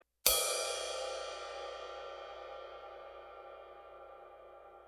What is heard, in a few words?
stage ride, cymbal; sabian